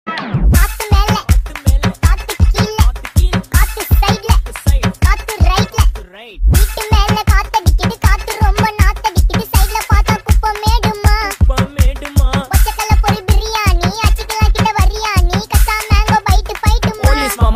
ringtone bgm ringtone tamil bgm

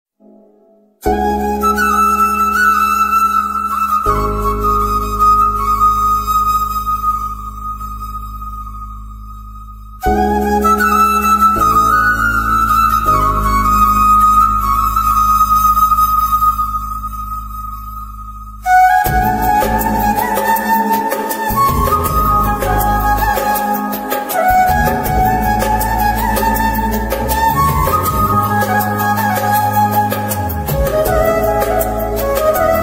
best malayalam flute ringtone flute flute song

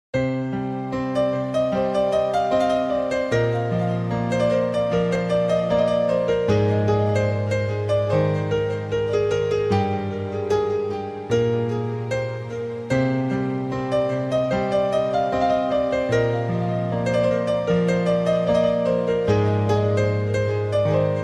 piano ringtone; markhor music; vivek oberoi; amrita

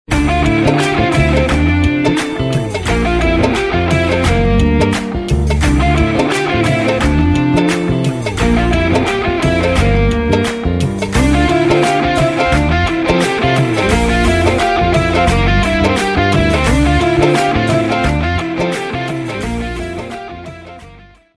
instrument ringtone

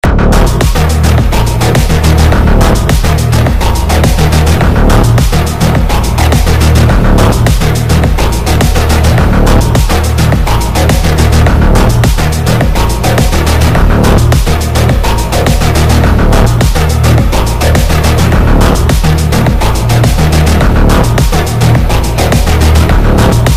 bgm bgm; aavesham; soda opener knife bgm; fahadh faasil

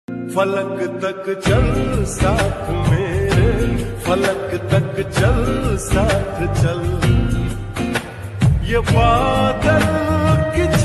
male version ringtone lofi flip ringtone

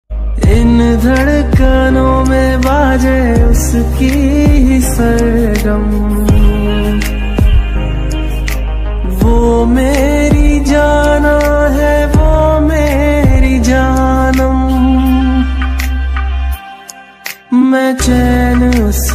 sad song ringtone

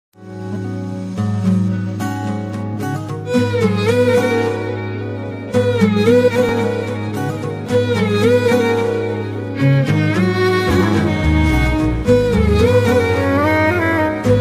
song background music ringtone

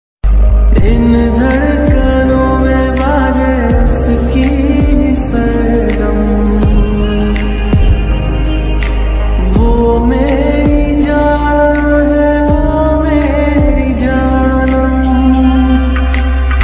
Hindi ringtone, sad ringtone

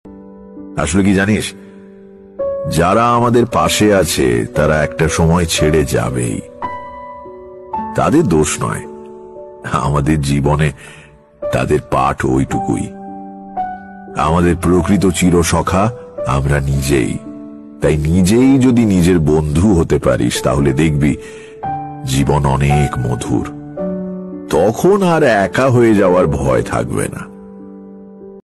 bengali song

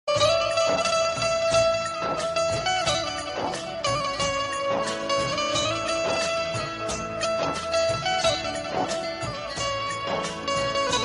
viral music ringtone mobile ringtone hindi

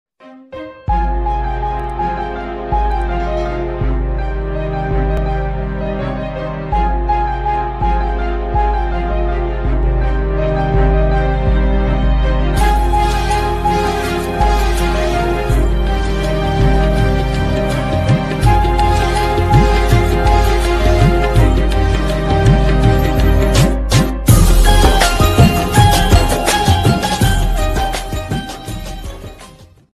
ringtone bgm; husband and wife romantic relationship best love bgm

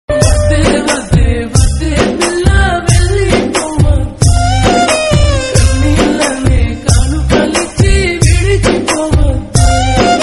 telugu love failure song ringtone

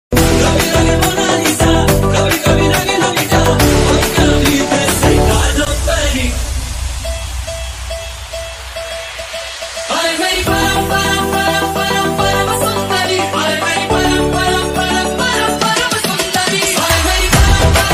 remix ringtone

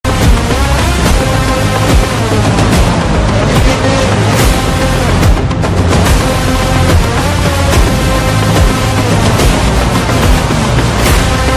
ringtone bgm; salaar leaked

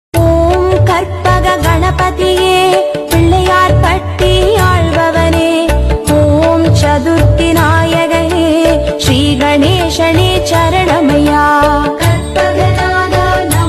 ganapathi devotional songs tamil ganesh songs

